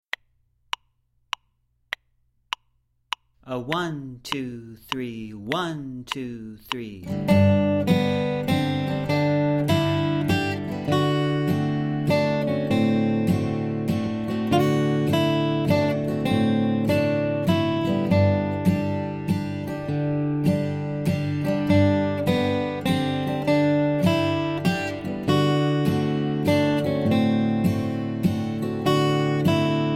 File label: melody